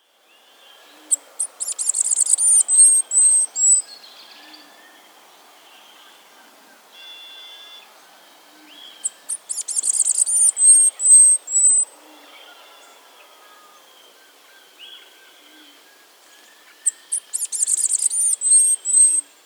Masked Flower-Piercer (Diglossa Cyanea), Andean Birds; S. America